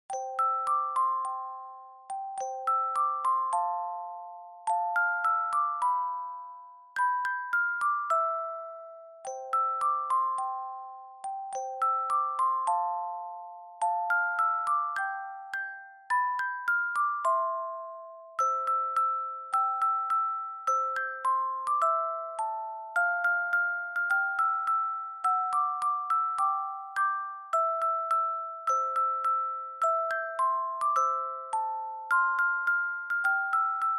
Dry Fast; bgm; Jingle Bells

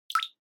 Water Drop High Dry

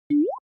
Anime Motion Low

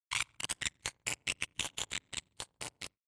Horror Accent, Effect Long

